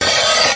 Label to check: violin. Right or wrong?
Wrong